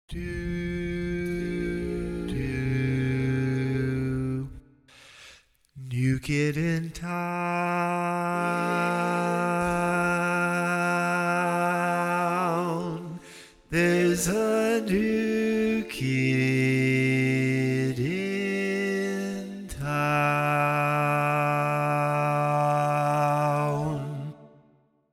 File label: Bass, barbershop tags